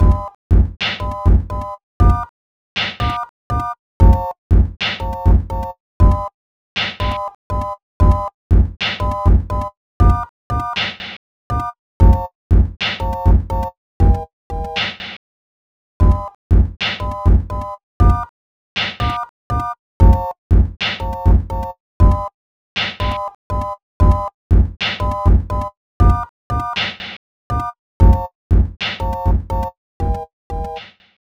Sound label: Sinewave Project